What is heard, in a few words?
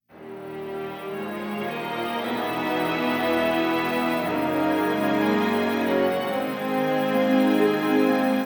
intro music